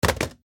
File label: Cardboard Box Dropped Foley Small Package; Miscellaneous Impact